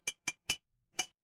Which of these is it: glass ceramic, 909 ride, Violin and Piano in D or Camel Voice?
glass ceramic